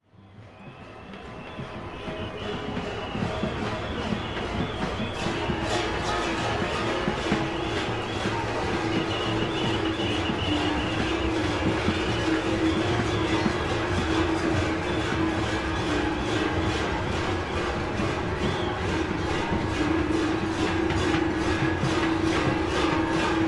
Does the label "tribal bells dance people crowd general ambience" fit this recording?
Yes